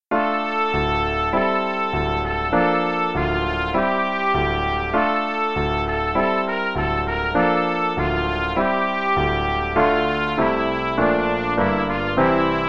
no click, qn=100, no dynamics, trumpet; The Really Easy Trumpet Book; Royal Procession

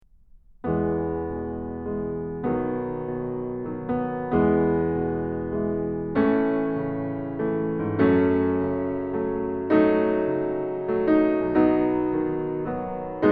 Prelude In E Major; Magrath Book 09

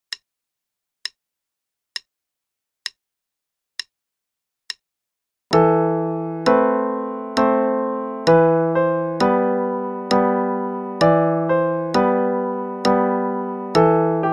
Play-a-long, qn=66

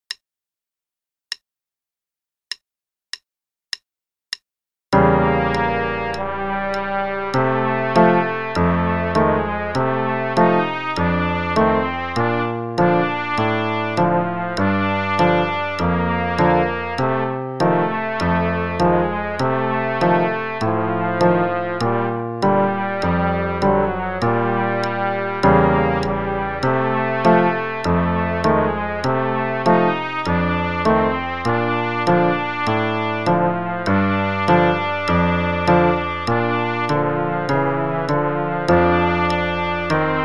Play along click, trumpet and piano, qn=100; Faber piano books

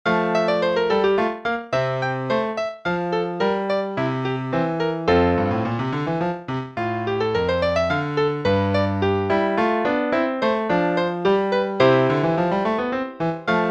Play-along Courante (qn=108), piano; Handel

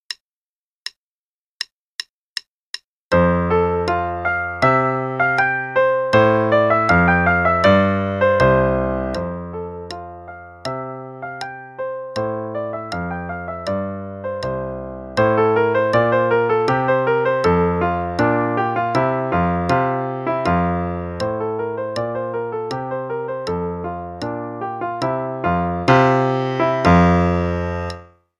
Play-a-long Gavotte (hn=80), piano; Speer

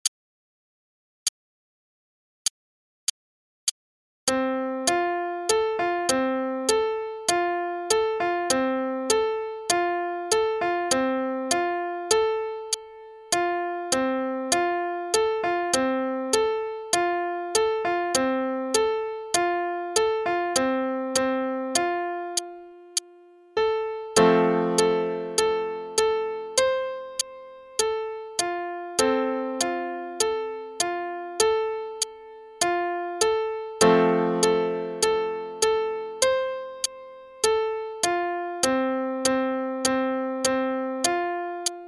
Reveille (st part, qn=100); Faber Adult All-in-One; Adult All-in-One Book 1

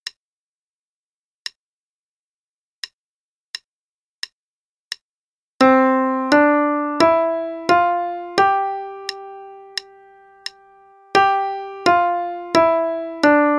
C Scale, qn=88; Faber and Faber; Primer, Book A; My R.H. C Scale; student part

